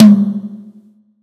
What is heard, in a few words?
acoustic tom; acoustic drums